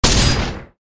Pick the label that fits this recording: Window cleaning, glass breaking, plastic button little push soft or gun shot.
gun shot